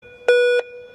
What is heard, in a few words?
beep